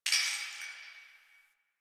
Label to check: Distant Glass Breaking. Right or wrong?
Right